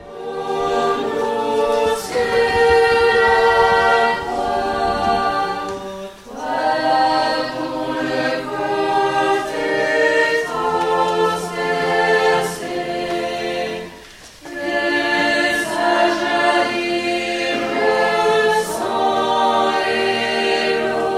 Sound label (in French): chant communion